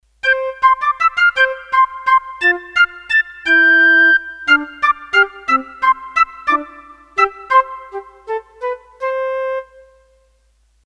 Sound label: Flute Piccolo, Roland D-10; Factory Patches